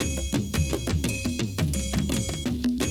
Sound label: Classic DrumLoops